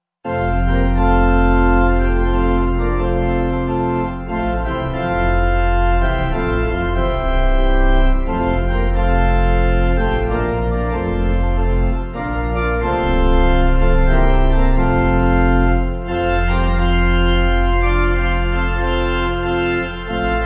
Pipe; Autumn

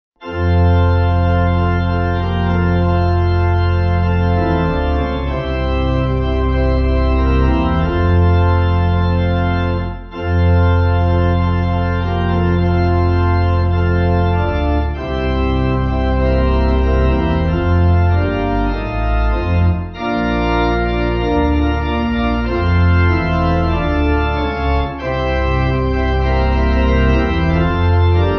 Pipe Organ

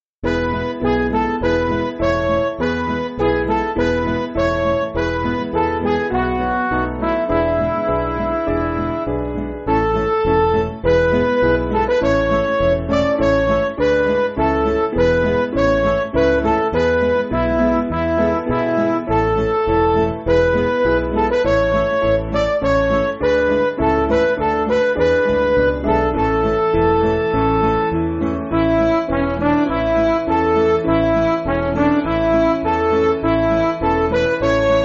Midi

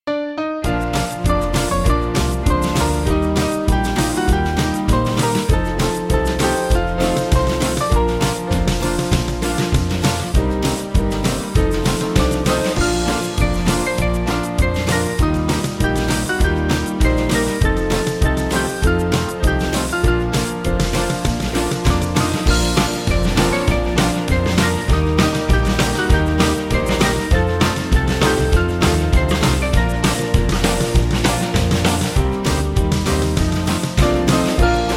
Isnt He Wonderful, Band; Small Band

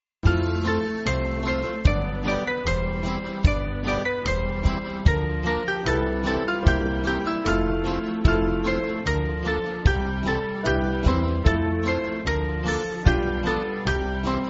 Little Jesus Sweetly, band; Rocking

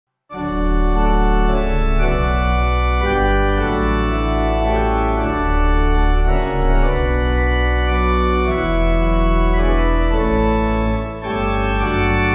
Pipe; Newman

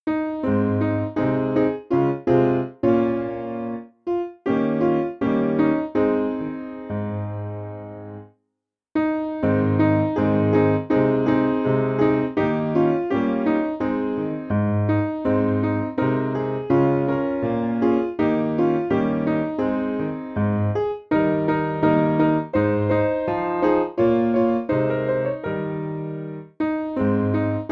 Piano

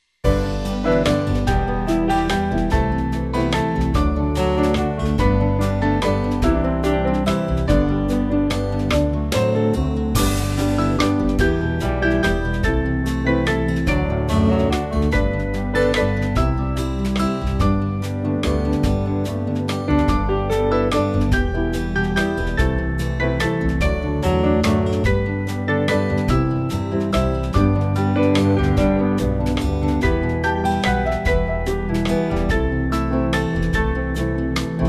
Hush Now My Child, Skye Boat Song, Band; Small Band

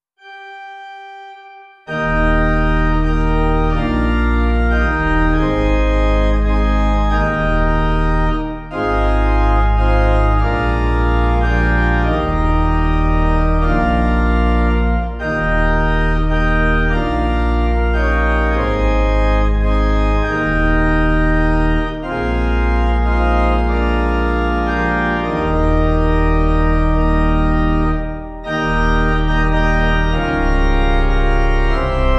Sanctus, Pipe; Schubert

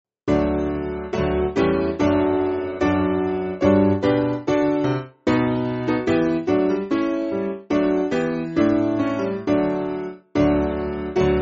Piano; With High Delight; Mit Freuden Zart

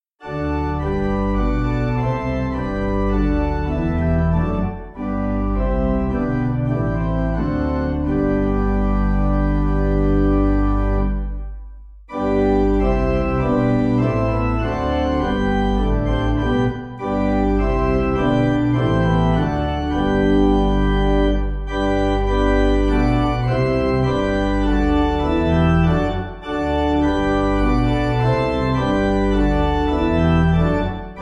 When Our Earthly, Singen, Pipe Organ; Rufer Singen

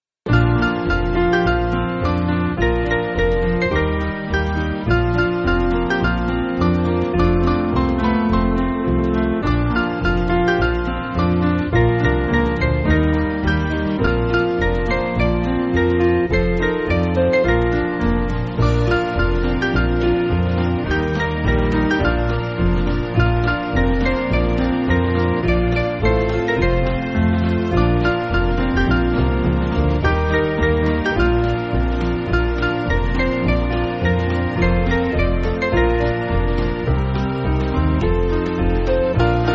Minor Piano

